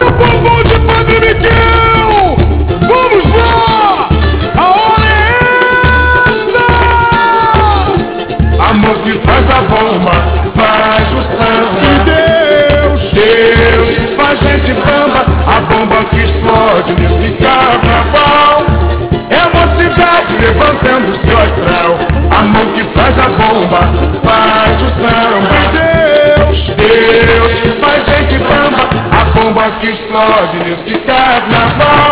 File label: samba